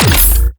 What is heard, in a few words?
heavy cannon supercharge